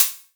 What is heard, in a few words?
Hihat O